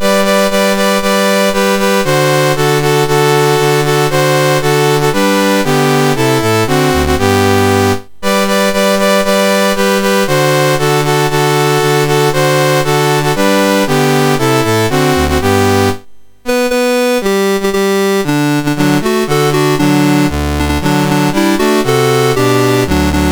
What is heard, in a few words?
Venezuela, National Anthems, MOS6581R4; Dave Marquis